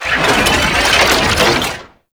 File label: Sentient Dactolyst Kick Charge; Warframe; Tau; Movement